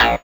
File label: SYN DANCE; Sound & Vision - Gigapack II